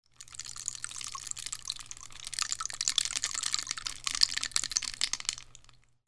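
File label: percussion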